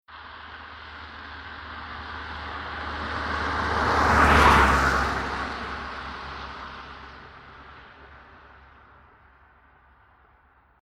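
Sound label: Car Saloon Passby Single Fast 50MPH Wet Asphalt; Transport; Car Sound Effects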